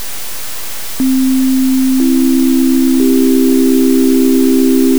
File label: cmajor noise